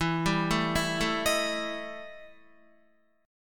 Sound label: E Minor Major 7th Double Flat 5th E Guitar